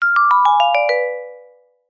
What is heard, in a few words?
cartoon descend vibraphone happy fun mysterious musical